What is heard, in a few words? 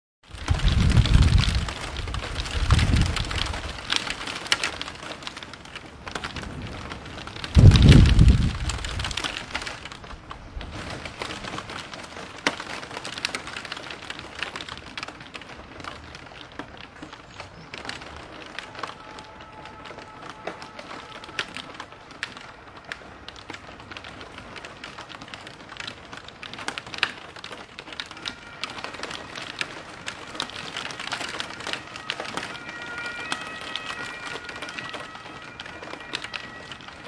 rain city thunder sirenes